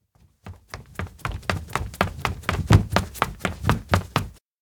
steps indoor hall run chase kids; anemoi パッケージ版 1.1

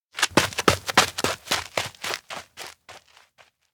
steps outdoor run; anemoi

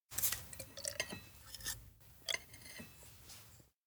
shaker fill; anemoi

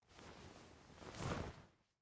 Baby turnover; anemoi

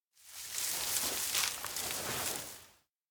grassland rustle something; anemoi

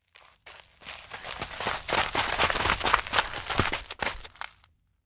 steps outdoor schoolyard gather kids; anemoi

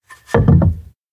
stone mill place; anemoi

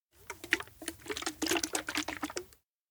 can shake; anemoi

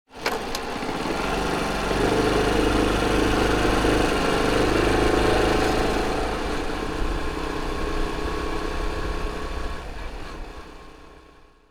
bike depart; anemoi

